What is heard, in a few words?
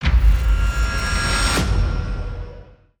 Menu Transition; Shmadow Linux macOS Windows gamerip 2015